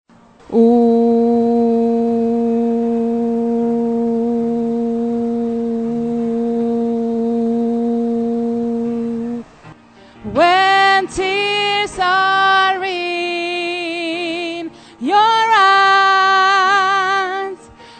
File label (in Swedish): sopran